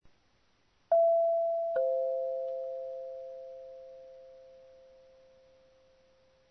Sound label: Doorbell